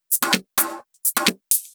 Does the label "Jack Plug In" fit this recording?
No